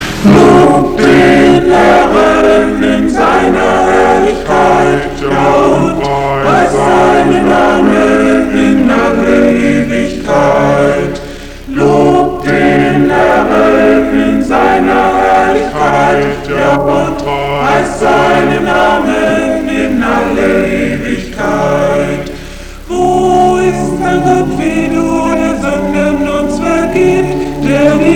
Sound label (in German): Chor Lobt den Herren